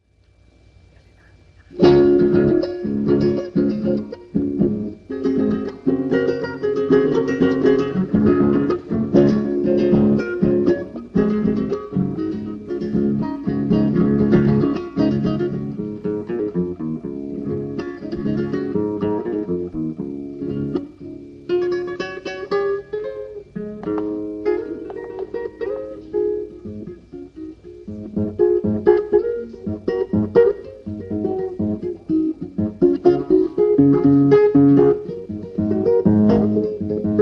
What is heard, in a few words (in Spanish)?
tonada instrumental